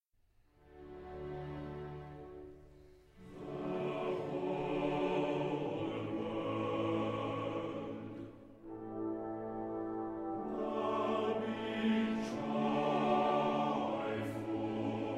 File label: Mozart flute